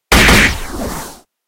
hit

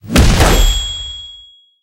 pvp win stamp

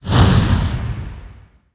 bomb fireburst

